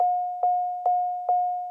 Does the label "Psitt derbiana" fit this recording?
No